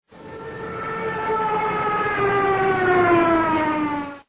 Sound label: plane falling; air fight